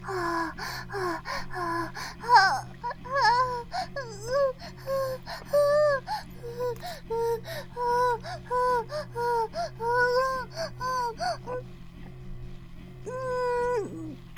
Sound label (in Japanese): バイブ放置; イクたびにわけて